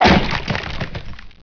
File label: gib, gibs; quakemapping